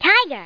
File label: tiger; 11,025 sample rate; 0.06 seconds; india